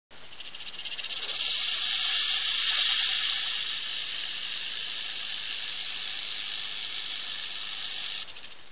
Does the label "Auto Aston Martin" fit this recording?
No